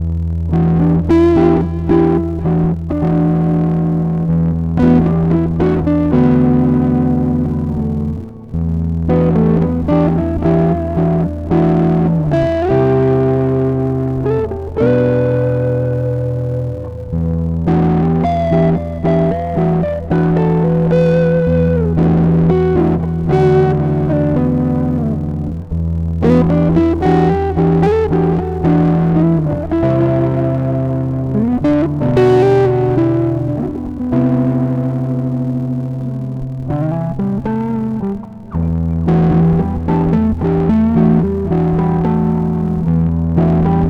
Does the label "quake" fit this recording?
No